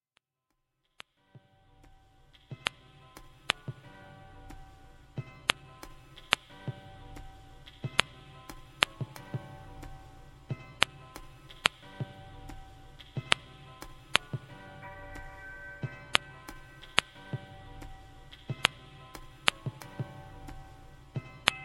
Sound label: programmable drum set interlude